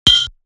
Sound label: blacksmith